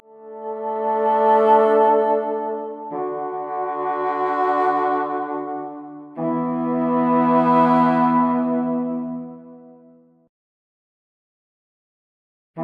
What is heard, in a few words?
Camp Wind